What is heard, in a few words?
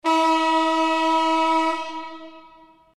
Sports Buzzer